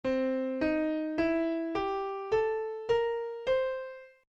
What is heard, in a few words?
Blues C; 16Blues